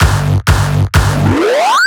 filler tool 128 G; Electro; filler tools; Anthemic

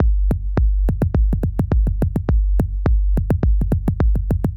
105 Loose Grooves, Percussion; Touch Loops; ElementsOne; AbstractRhythms

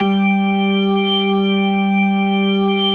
HAMM G3

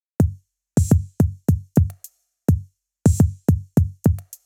105 Loose Grooves, Percussion; Touch Loops; ElementsOne; Abstract Rhythms

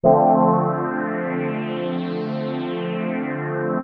house synth 125 A, keys

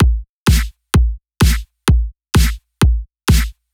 drum loop 128 Strp; Anthemic

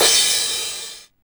909 CRA, TR-909

